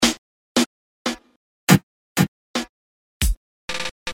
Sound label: Reggaton